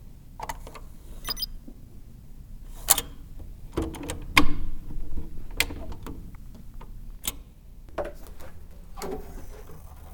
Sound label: into room